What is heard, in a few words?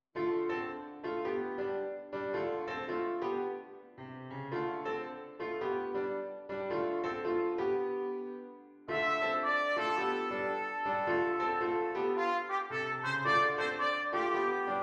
Beautiful Wander trumpet, trumpet flugel or cornet